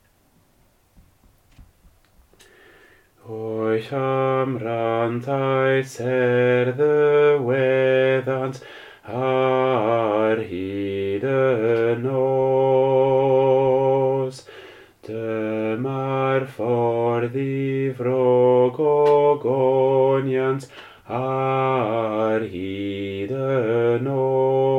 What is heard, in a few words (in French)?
alto